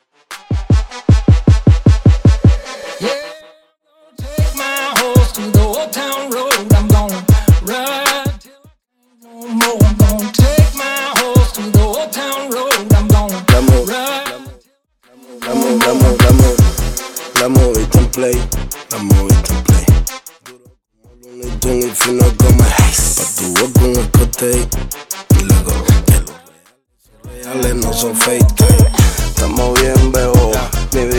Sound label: Remix Intro Outro Clean 77 BPM HipHop